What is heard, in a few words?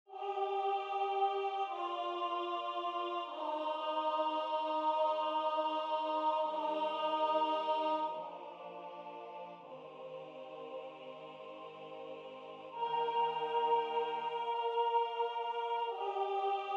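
alto; sanctus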